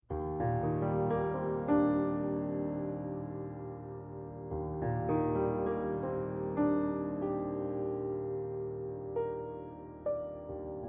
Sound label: eb major piano